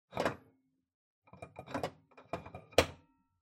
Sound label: Ceiling Light Tube Install